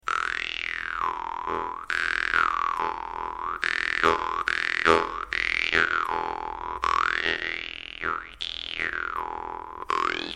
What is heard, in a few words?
parmupilli E, Parmupill bass, vargan